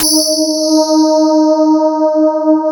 CMI PIANO; Wizoo - Powered DX

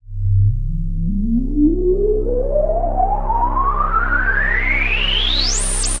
Juno Noise

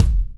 Kick, Kickdrum; Equipped Music; Loungin House

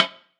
perc organic grok

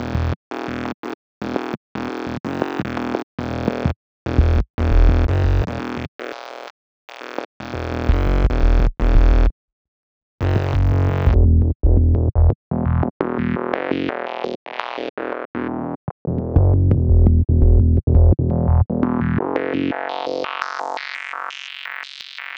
Zyn Bass, Drum n Bass; KJ Sawka; Drum n Bass & Beyond

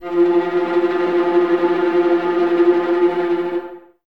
Violas Tremolo, String Ensembles, F; Miroslav Vitous

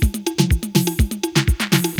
SOCA BREAK E, BREAKBEATS; Crypton - DrumBase5000; BREAKBEATS#2

